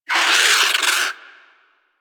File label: Avichaea Vocal Alerted; Warframe; Hunting Critters